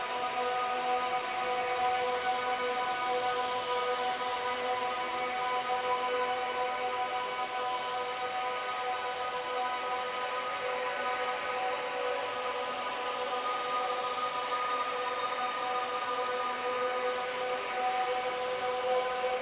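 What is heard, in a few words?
Ambience Artist Base Airy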